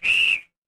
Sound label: instrument whistle